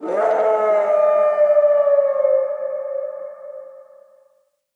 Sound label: Wolf Cub Howl Stinger; Vocals; Animals